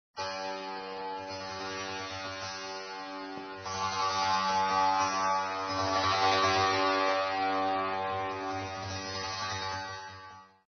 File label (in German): Monochord